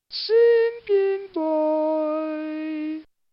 singing boy

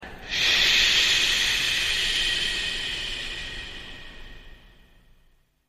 ssshhh

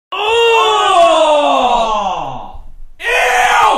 augh ew

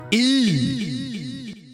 puff

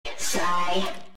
sigh

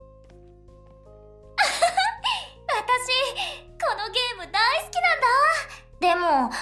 hahaha

